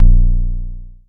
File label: analog tonal, kick; Ableton Foundation FreePacks